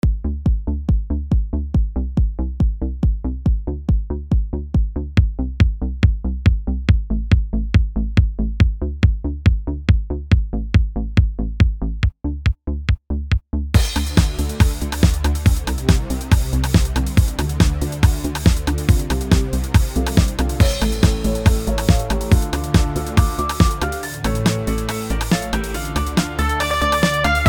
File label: remix